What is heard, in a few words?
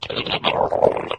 death, flood